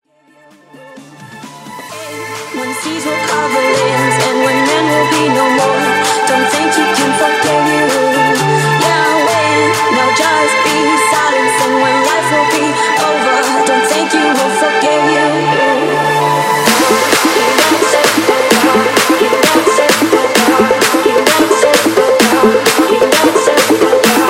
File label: Remake Mashup; Pitch Edit